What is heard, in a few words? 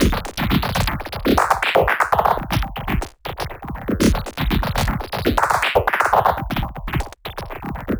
Anabeat Mix, Beats; Sonic Foundry (Sony Creative Software); Prototechno